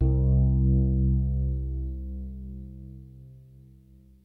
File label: DYNO RHODES, E-PIANOS; Kid Nepro - Elite